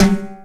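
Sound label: timbale hit; Sounds Good - Roots Reggae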